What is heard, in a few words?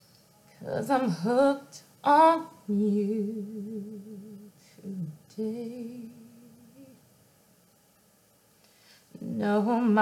Soulful Interlude